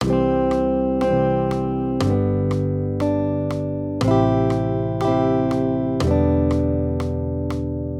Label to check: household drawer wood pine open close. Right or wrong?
Wrong